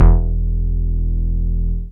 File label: BAS MINI, MOOG; Sound & Vision - Gigapack II CD 1